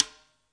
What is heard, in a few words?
real snare; REAL LIVE KITS; A Real Freakin Drum Kit